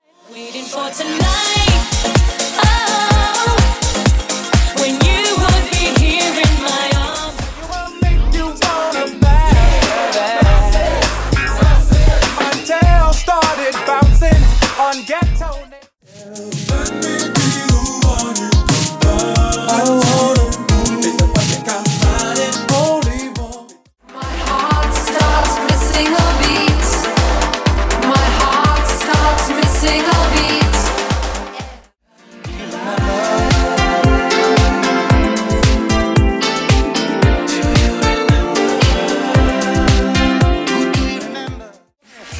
Classic Redrum; 10 Edit Pack 4

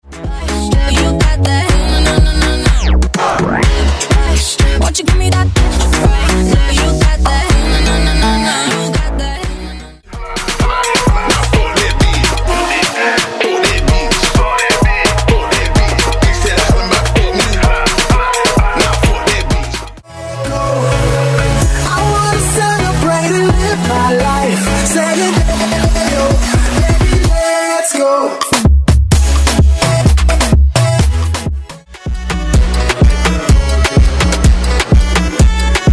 Afro Beat Megamix

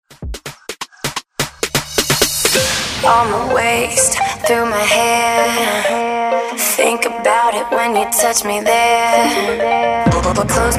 Afrobeat Redrum

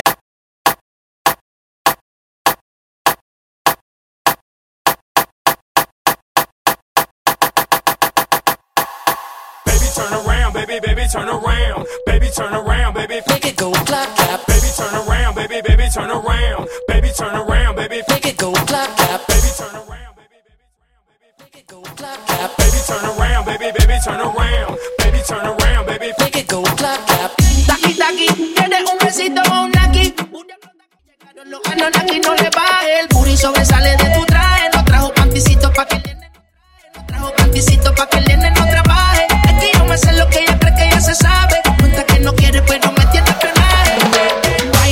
Moombahton Clap A Pella Starter 102 Bpm; Edit Quick Edit

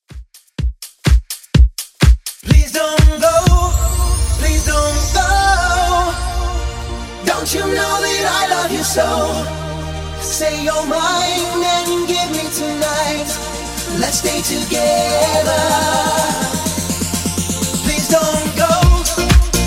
90s Redrum 125bpm

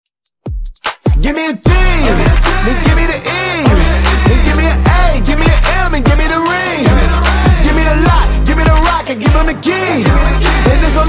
Dj Intro Outro 75bpm; remixplanet

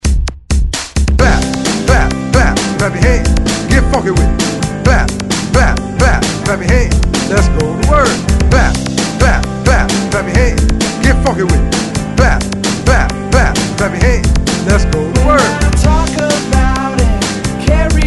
90s Hype Rock Re Drum 130 Bpm; DJDOLLS

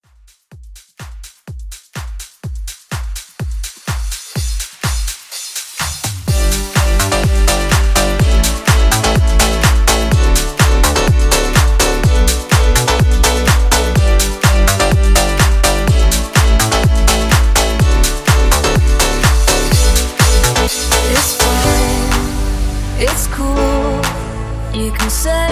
Future House; Remixfreakz

